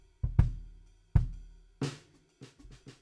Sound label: BD Out